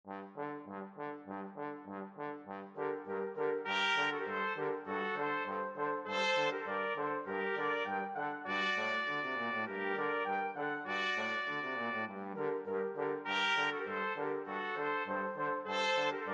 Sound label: Danses des cygnets, flexible mixed ensemble 3 players